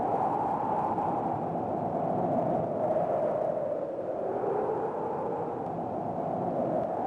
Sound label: cool wind; cstrike; spr1n